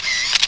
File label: gear; duke nukem total meltdown